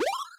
Bonus

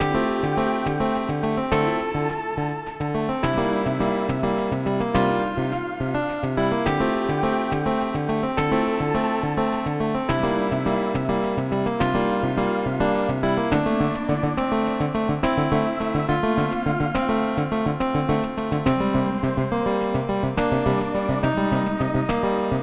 Tension; Musics; TowerDefenseGame

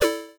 Menu

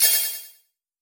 punch; Chibi Monster Free (Unique Skill Animated Prefab with SFX); Monk

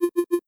Alert

